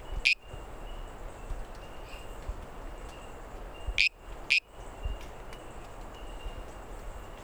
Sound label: Pristimantis munozi